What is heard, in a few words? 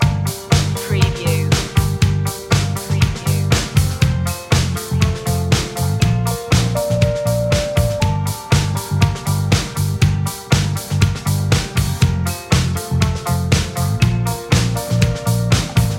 catchy groove loop